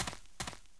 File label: Run